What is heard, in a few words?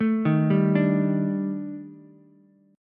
D sharp Major 7th Flat 5th, down, Ukulele; arpeggiated; JGuitar